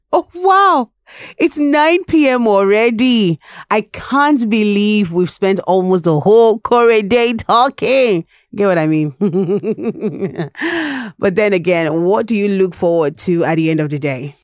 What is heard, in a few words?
Interlude